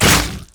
ranged attack; LoL; jayce

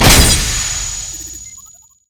noxious trap; LoL; astronautteemo

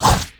spectral basic attack; LoL; yorick

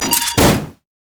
rapid reload; Corki

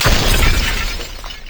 detonate; LoL; varus skin03

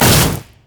deadly bloom; LoL; zyra

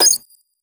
microrockets; LoL; heimerdinger

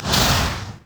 ghoul; LoL; yorick

